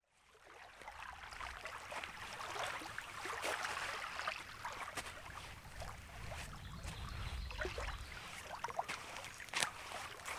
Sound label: Walking near Lake; Wisconsin 2019